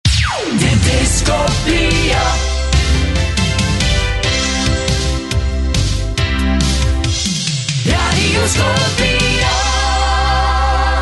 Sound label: jingles; Scoplia Haacht